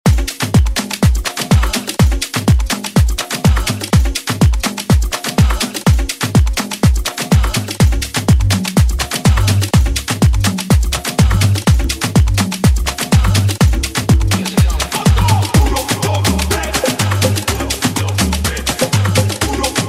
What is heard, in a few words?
Afro House; Deejay Hit